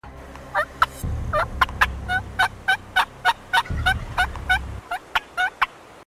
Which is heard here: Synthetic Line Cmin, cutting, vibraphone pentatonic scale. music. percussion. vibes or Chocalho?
cutting